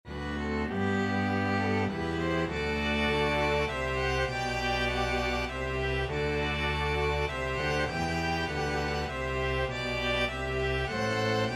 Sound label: first noel, string ensemble